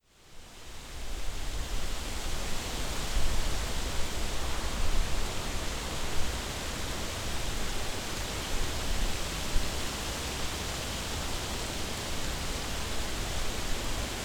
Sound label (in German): SchwarzPappel; Novi Sad